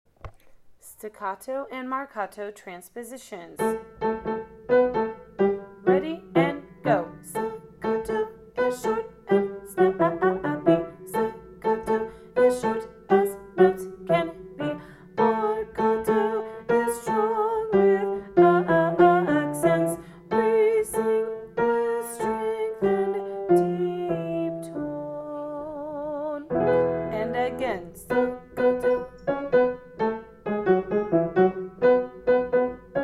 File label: staccato marcato